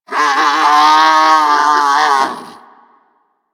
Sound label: Vulpaphyla Lure Call; Warframe; Hunting Critters